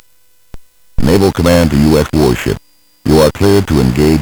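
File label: Ocean Ranger, MOS 8580 SID chip music, games; CSG8580R5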